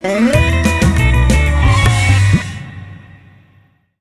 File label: Mission Failed!; The Simpsons Hit & Run; PS2; Large American Gas-Guzzling Vehicle